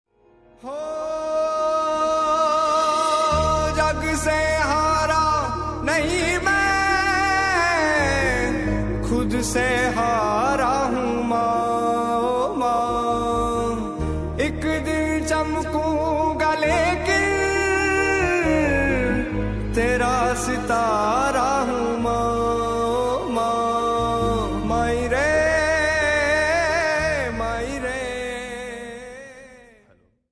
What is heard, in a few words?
gujarati ringtone